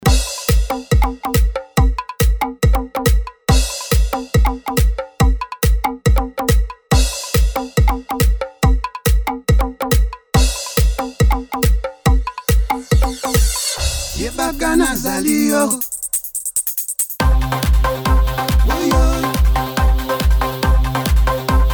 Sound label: tribal